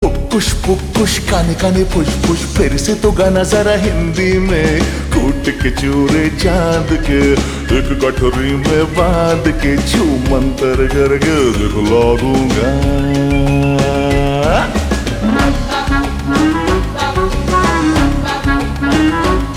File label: Ringtone Slowed Reverb; Bhool Bhulaiyaa 3